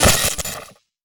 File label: explosion lightning; Cielonos; Wwise